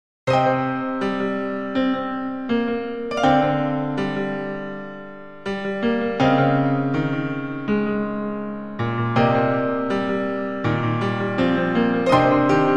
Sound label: piano; soleil-musique; Splendid